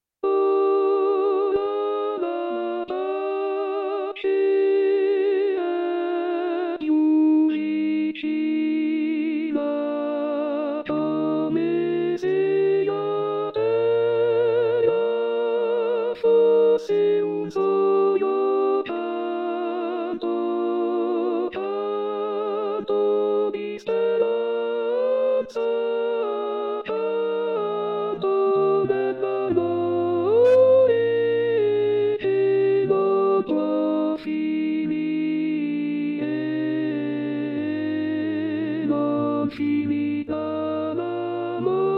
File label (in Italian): Contralti; natale